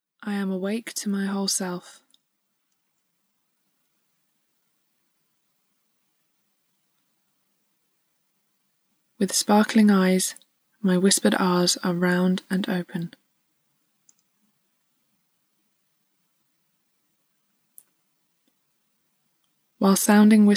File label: Whispered Ah